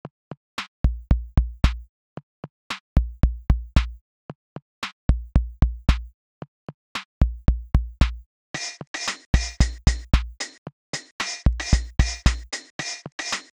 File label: koto funk